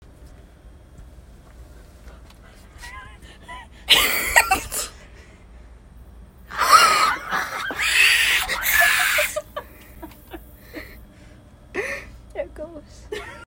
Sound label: scream